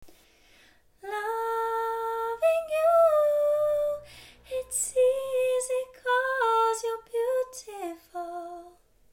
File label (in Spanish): Falsete